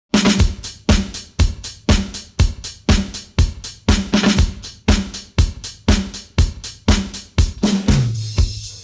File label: drums pattern